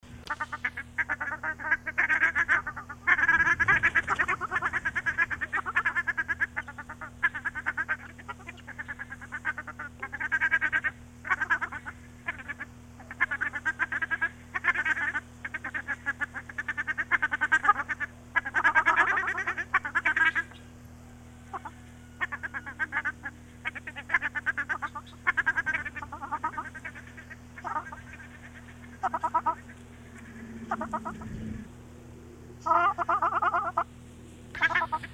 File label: Tadorna